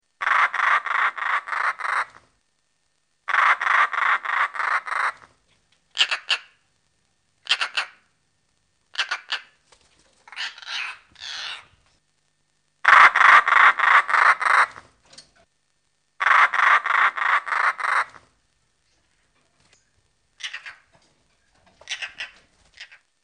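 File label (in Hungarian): Szalakóta; Madarak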